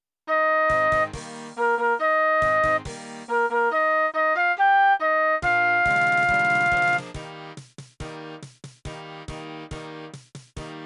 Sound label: Flute